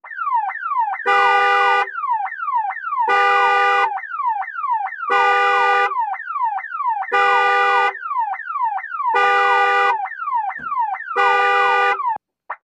Car Alarm Series, Car Alarm with Horn 2.

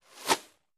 Arrow Sizzle Bys Medi; bow & arrow bys

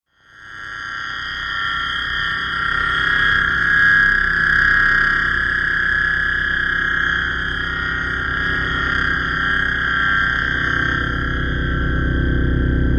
Warm Up High Generator P, metallic

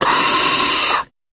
Hospital Respirator.

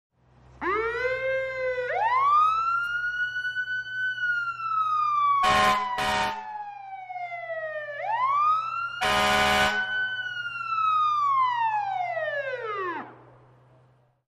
Firetruck Siren Loud

